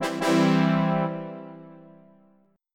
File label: TADA; Presentation Partner